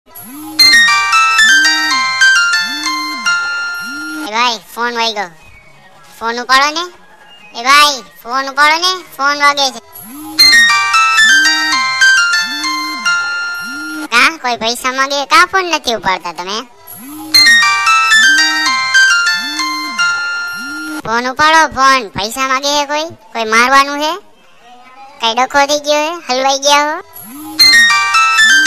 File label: Nokia Gujarati Tone